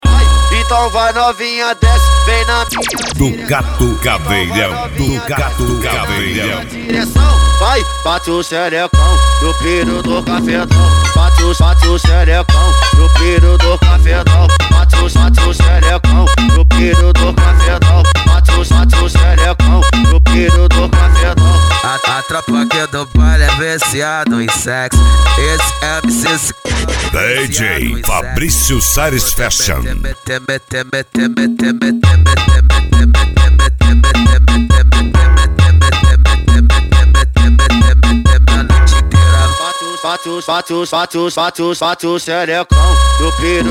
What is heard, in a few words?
funk mega bass